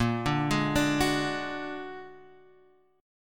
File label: Bb Augmented 7th Bb Guitar Half Step Down, chord; arpeggiated; JGuitar